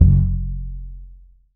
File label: TUB BASS F#1; Country